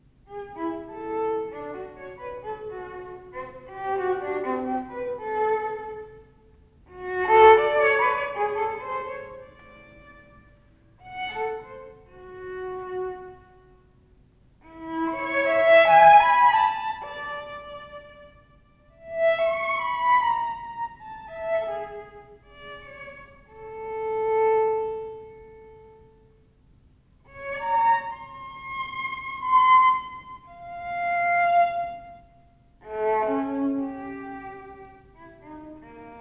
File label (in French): Cinq poses pour violon seul